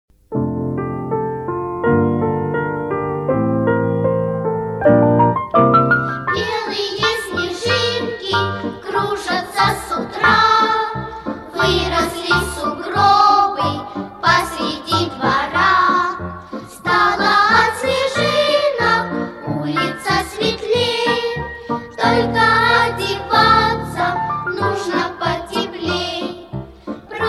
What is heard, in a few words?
detski choir